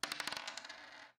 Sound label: dice